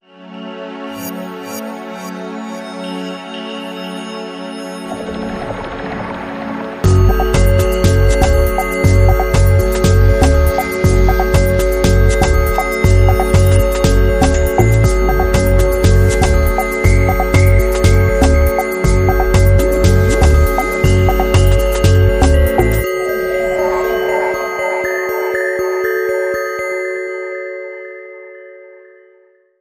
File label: Dub